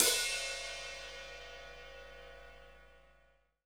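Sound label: CYMBAL, JAZZDRUM; Ueberschall; Drum & Bass Flash Trackz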